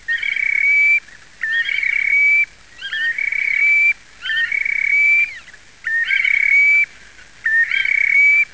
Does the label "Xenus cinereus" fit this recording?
Yes